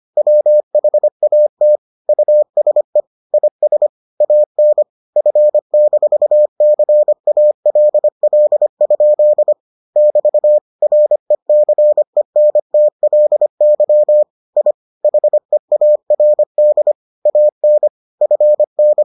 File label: morse